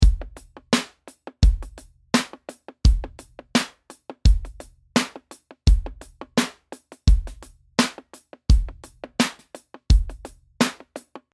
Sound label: Straight Hop 85